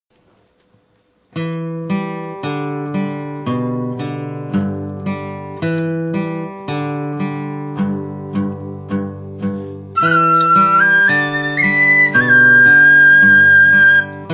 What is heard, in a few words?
flute; hu1fa3shen2; Ohio